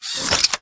camclick

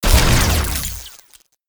a bat poweroff

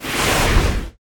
fire boom cast

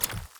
water strike

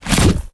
gas throw

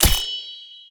dark katana

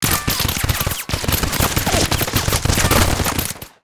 bullet barrage

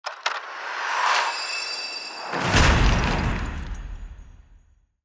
load

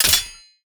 scabbard sword hit

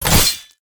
sickle metal

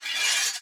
sword stone

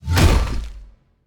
axe imp

